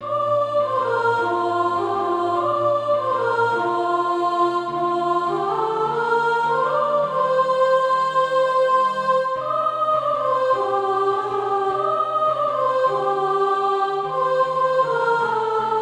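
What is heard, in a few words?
sop, Practise Music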